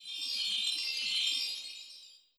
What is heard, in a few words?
magic chime title; Politburo